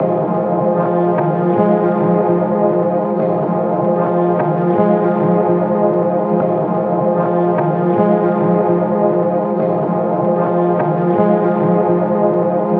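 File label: pad loop state forest 150 F minor; Virtual Riot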